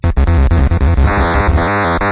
deep Dumb

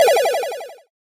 ball hits hole, golf

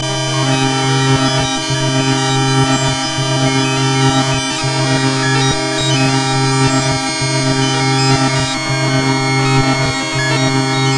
thinking

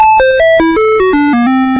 woops

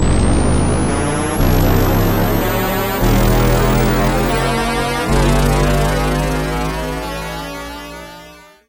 crazy Up

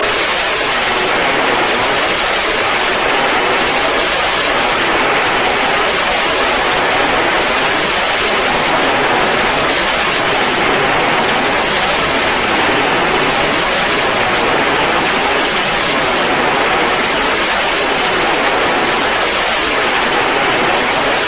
phasy

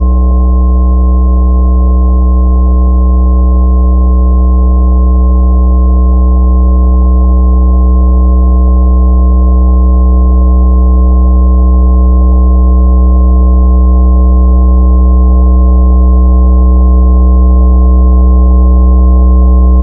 very Low

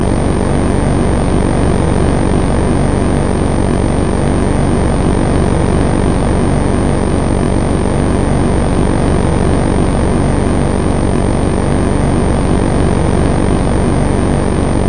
hum

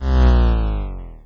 slomo Start

